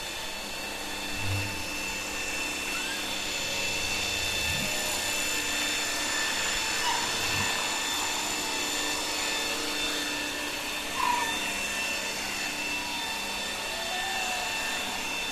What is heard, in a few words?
Humpback whale song outboard